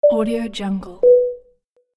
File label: airplane pilot to crew signal